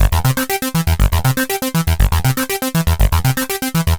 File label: Arpeggio Bass, Prototechno